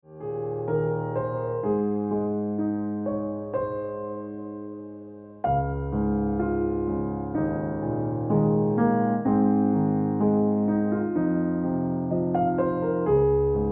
Ringtone Piano Music

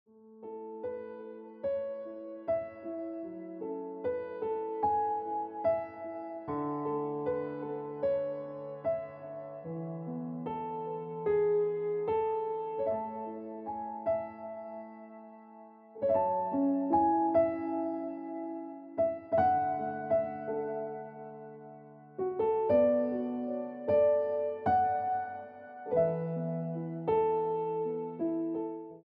Happiness Of Waiting Ringtone Piano Music

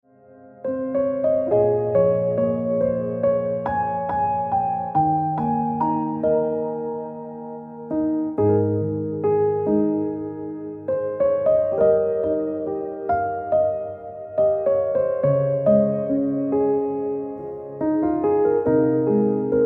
Ringtone Piano Music

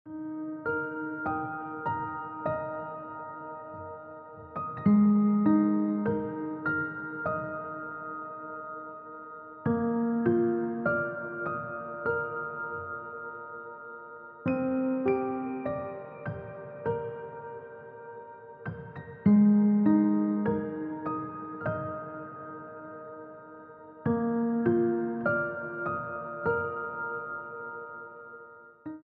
Piano Music

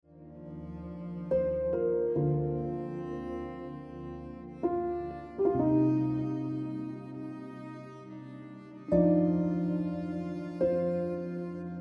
Jade Ringtone Piano Music

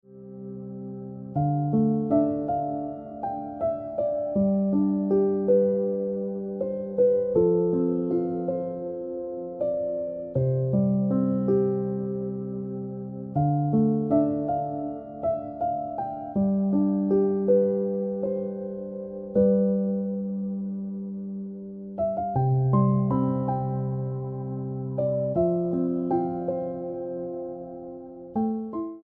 Piano Music

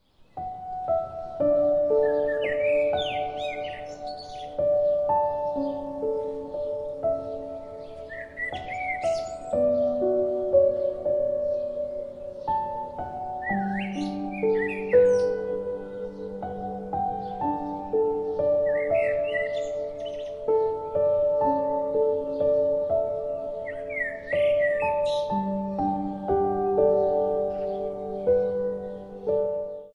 Ringtone Piano Music

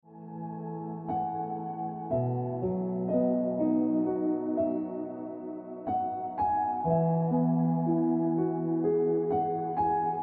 Ringtone Piano Music

